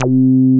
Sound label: MOOG HI C3, ANALOG BASS; Music Store - Claudius Diemeter Schwerlos